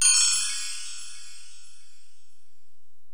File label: Korg x5, Drums